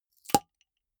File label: flip-top bottle open unseal; berufe